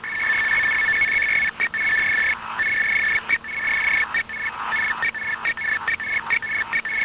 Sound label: sw arq